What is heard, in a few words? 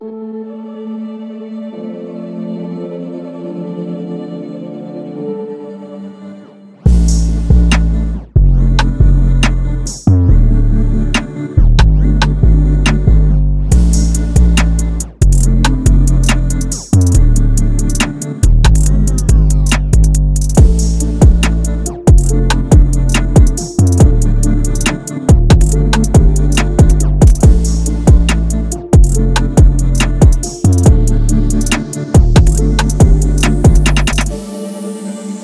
Trap beat